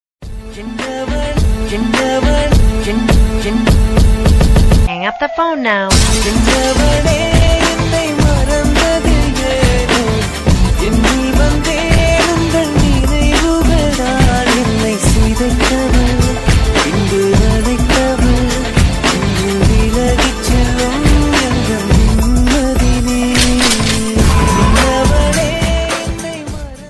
song sad

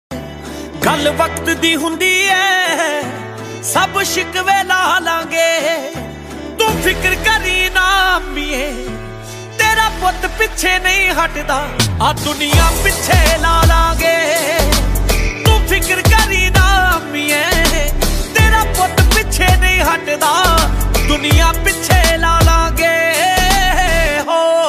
song ringtone, punjabi song

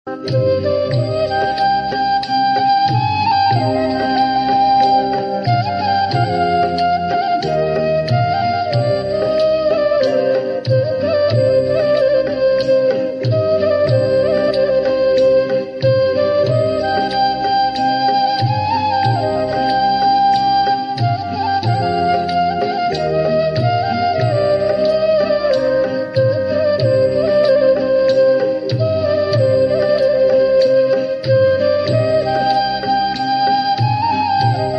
song instrumental ringtone

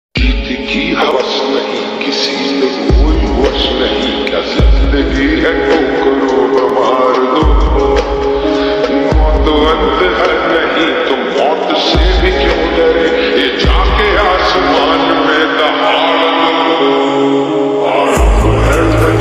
ringtone bgm beats

